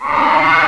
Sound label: Alien Cow Moo; 1997 MMX LAN PACK (win 95); Army Men